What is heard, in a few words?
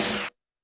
Snare, Roland TD-20